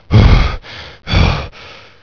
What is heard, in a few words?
Gasps